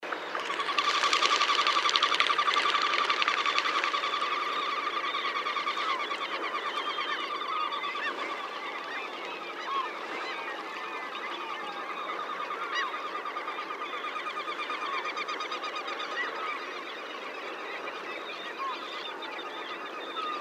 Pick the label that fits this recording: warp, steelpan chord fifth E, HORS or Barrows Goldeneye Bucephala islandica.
Barrows Goldeneye Bucephala islandica